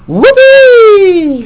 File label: whoopy